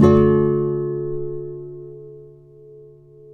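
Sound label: nylon guitar down major D#, flam chords; Spectrasonics; Hans Zimmer Guitars VOL-2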